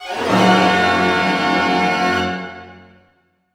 GLISS C MAJ; Sound On Sound - Peter Siedleczek's Orchestra

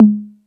Beat Box; E-MU Pro-Cussion

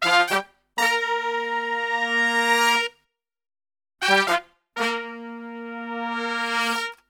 SOUL BB, Brass, SOUL LICK; Best Service; Brass Super Section

